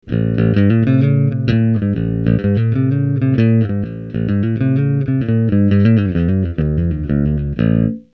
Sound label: bassman picked, sansamp bass